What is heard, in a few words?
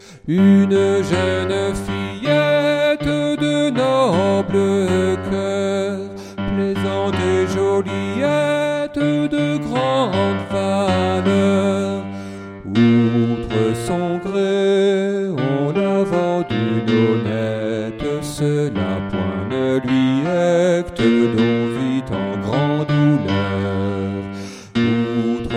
tenor; Une jeune fillette; Anonyme